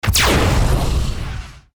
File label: boss laser; level 4